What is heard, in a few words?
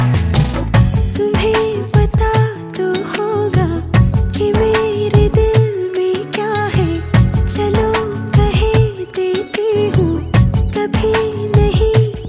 Female